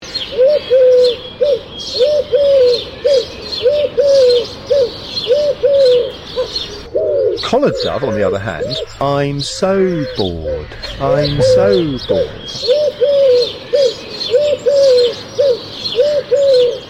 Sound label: Collared Dove